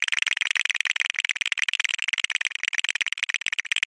shaking and clattering

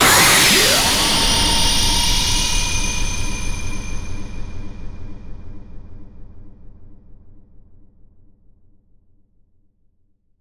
finishing impact

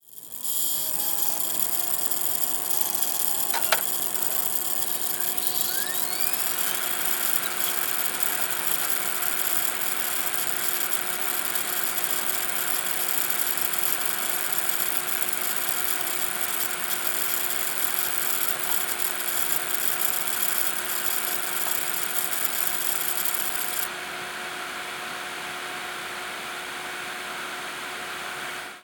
computer starting up

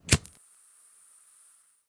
lighter producing flame

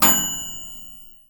microwave heating complete

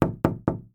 knocking three times on wooden door

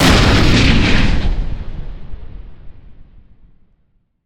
tank cannon

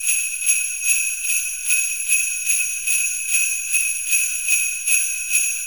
santa clauss bells

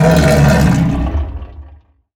beast monster cry

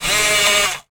robot arm moving

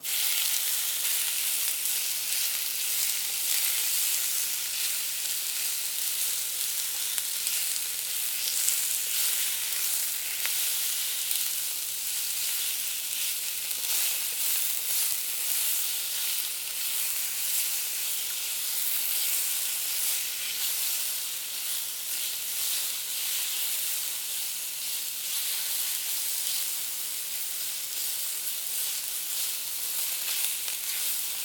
stir fry

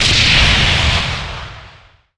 big explosion

